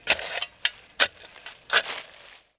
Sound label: dig; Zeus